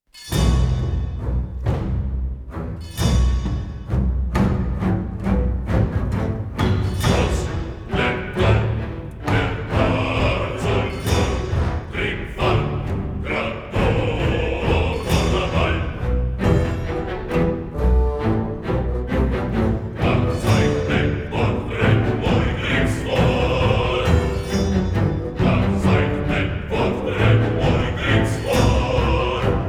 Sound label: Hymn; AFK Journey Echoes of Dissent Original Soundtrack